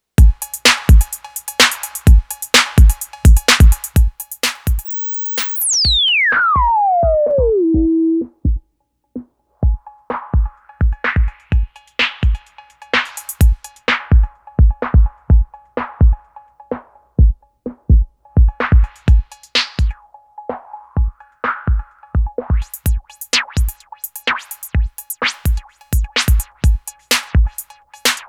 test filtered source, TEST: external loop filtering, Moog Minitaur; analog monophonic synthesizer